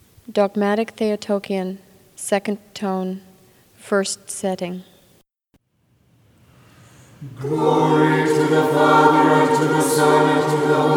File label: Dogmatic Theotokion Tone 2; Setting 2